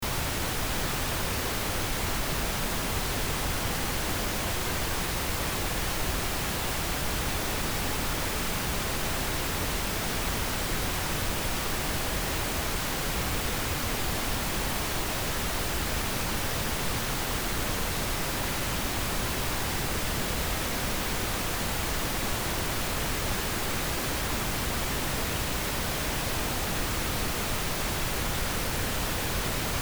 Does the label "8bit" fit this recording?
No